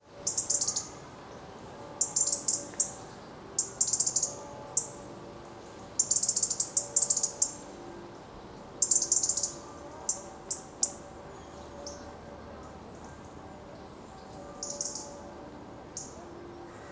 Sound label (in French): Cloche